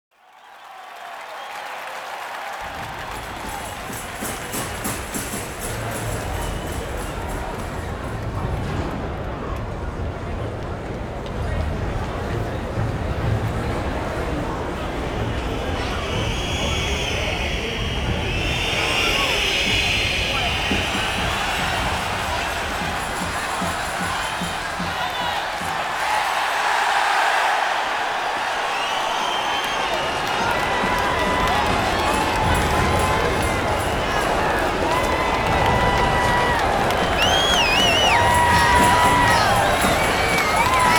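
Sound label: ambience stadium; Tony Hawks Pro Skater 2X; XBOX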